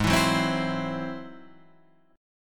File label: Ab Augmented 7th Ab down; Ab+7 strummed; JGuitar